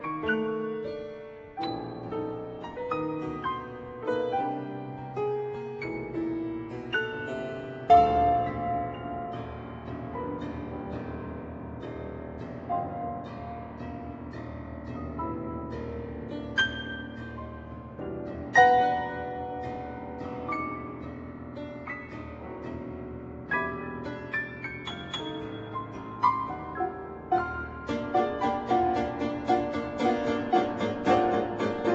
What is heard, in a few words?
Piano Quartet